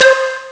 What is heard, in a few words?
dong; Amiga Soundtracker Sample Packs